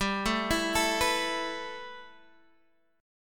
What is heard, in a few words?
A Suspended 2nd G; Asus2/G; arpeggiated